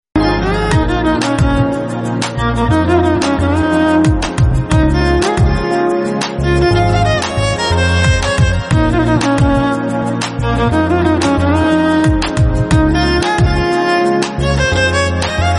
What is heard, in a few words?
music kannada